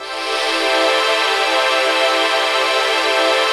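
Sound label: chord pad, Pads; Best Service; XX Large - Pads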